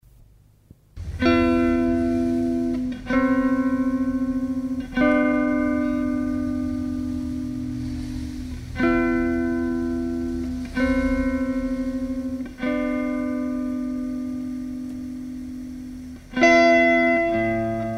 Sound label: somber rain, instrumentals